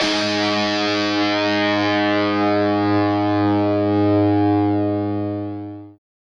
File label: g sharp, guitar